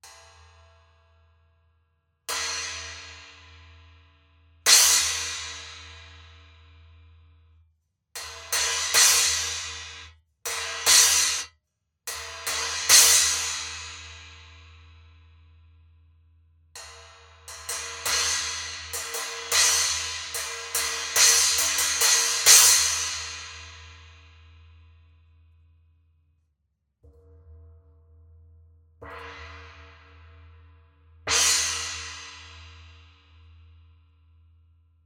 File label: Paiste 10 PSTX Swiss Splash